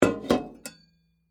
Teapot Set Down, Household, Teapot, Set, Down, On, Wood, Full, Metal, Antique; Kitchen Sound Effects; BlastWave